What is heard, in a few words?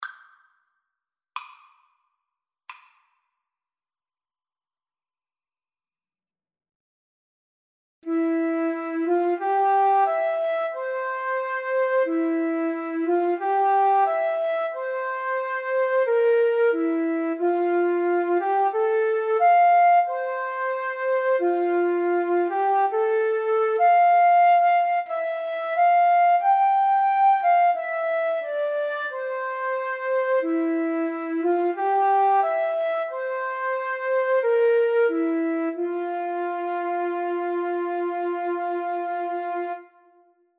jodler FL duet, Flute Duet